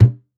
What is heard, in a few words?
Def Damp, Def Drum; Percussion Samples